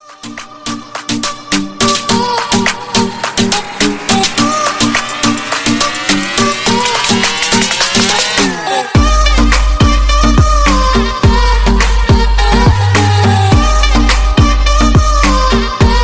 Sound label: arabic trap music ringtone